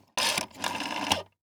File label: Household Phone Dial Rotary Four